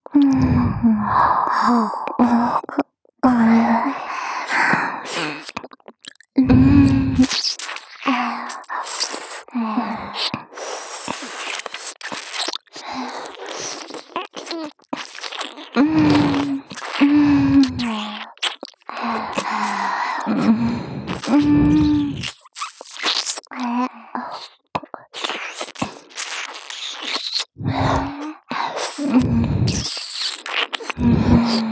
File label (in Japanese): 両耳舐め, ASMR; サキュバスな新妻の両耳舐め